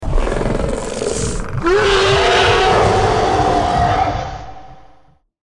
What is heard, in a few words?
heavy arms; predator wolf